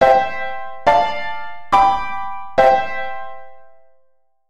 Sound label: perfect cadence I ii V I